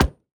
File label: environment hammer hit